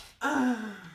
Ohh

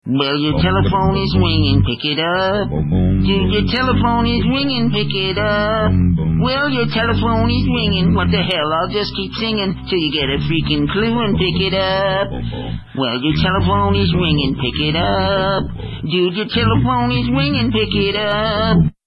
Hillbilly Phone Song

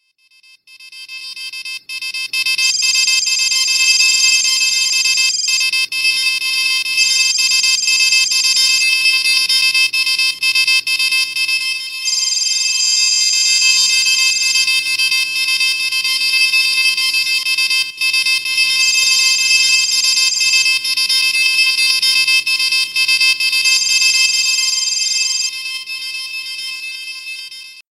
Iphone 3 Clock Alert; iPhone6

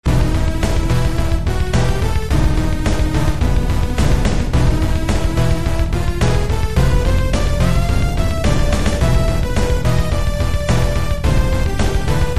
Rock; Magma

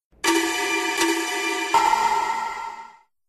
Scary news; Anime

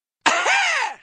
kiss adlib

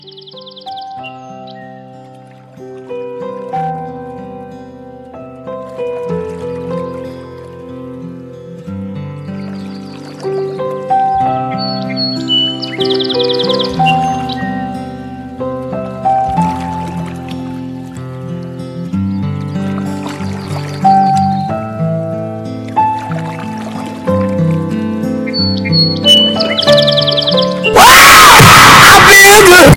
ALARM

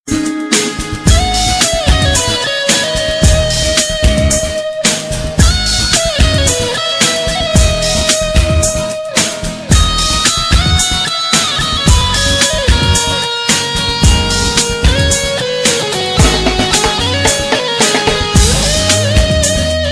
Guitar Romantic

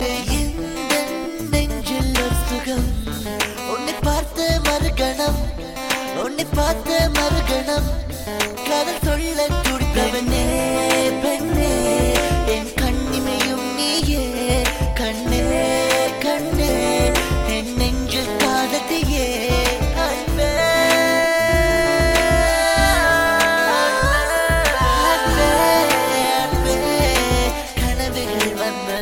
Nice Melody

